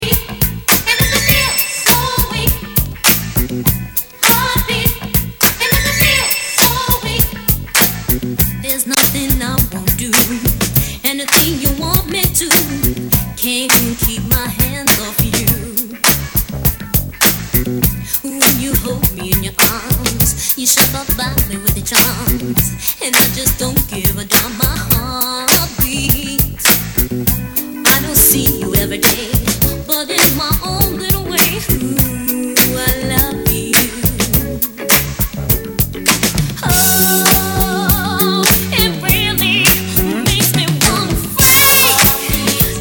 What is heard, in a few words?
Heartbeat